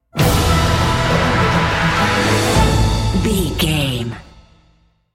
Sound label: cinematic corporate sting bumper